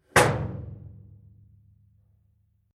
Industrial Small Metal Door Hatch Closed Metallic Long Ring Reverb; Metal Door Sound Effects